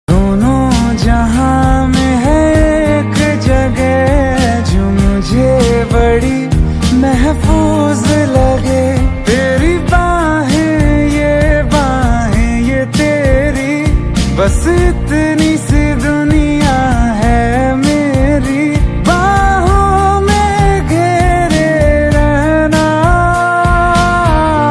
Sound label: love ringtone new ringtone